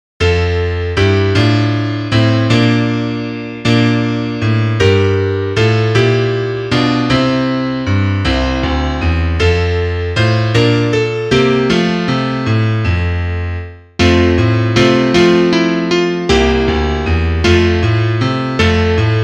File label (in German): Klavier; bunt sind schon die waelder